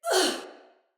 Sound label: Pain, Female; Sinecure Soldier Female B